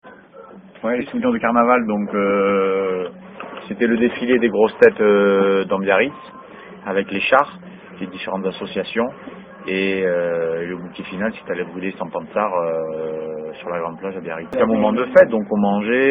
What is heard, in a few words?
vocal